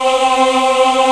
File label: choir; Descent